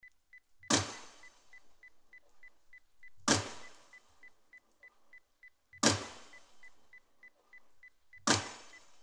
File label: Pile Driving